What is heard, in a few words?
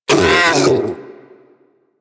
Predasite Vocal Pain; Warframe; Hunting Critters